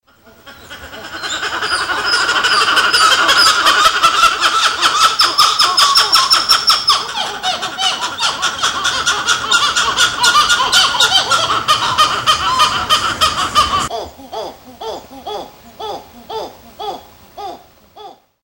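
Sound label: Callicebus personatus; PN Itat; A Silveira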